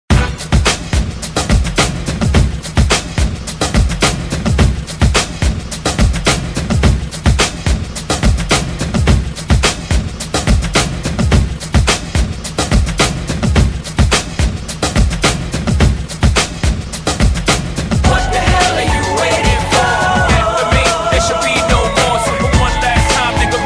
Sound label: Dj Intro Outro Hip Hop Hook Redrum